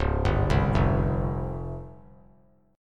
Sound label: C sharp Major 7th Flat 5th, C sharp, chord; arpeggiated